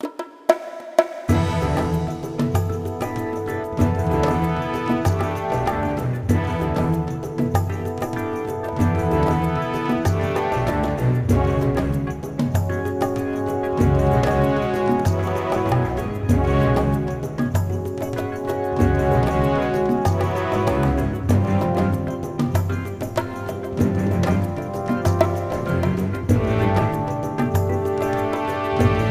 Volcano; Heartflame Volcano

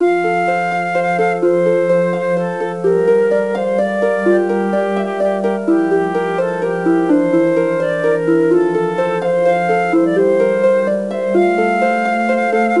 Flute; Hello Kitty Birthday Adventures

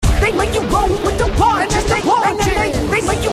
scratch roll; Strong Bad's Cool Game for Attractive People

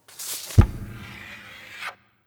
photo drop

